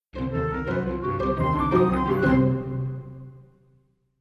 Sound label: sting subway mugged; The Sims 3; windows gamerip 2009